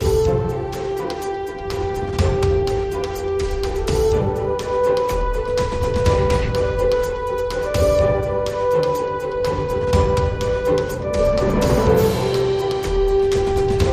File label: Happy Ride